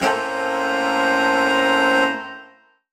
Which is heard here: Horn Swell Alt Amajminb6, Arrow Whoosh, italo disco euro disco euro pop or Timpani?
Horn Swell Alt Amajminb6